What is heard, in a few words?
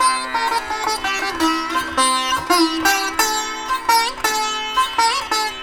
SITAR, Indian Traditions